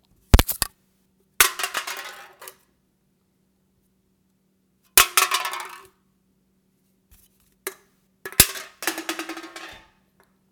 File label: Throwing Metal Can